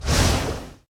Blackhole Out

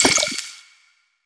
Projectile Burst

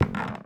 Footstep Wood-Creaky; viewfinder

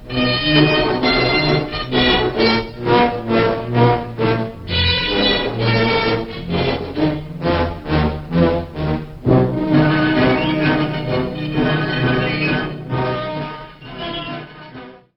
Japanese Music; Mars Sortie

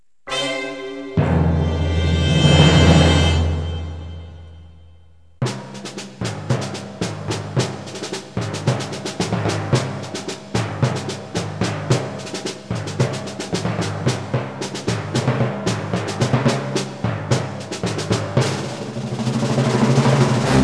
Success; Hidden & Dangerous

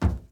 Footstep Metal Hollow

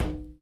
Footstep Glass

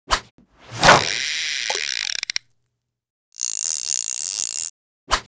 Fishing Normal; MiniGames

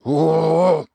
Worm Charge; Col Worm Charge

